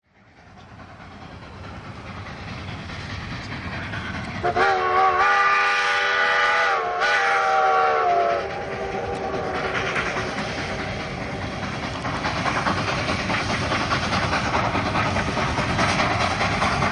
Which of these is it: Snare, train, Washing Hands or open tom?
train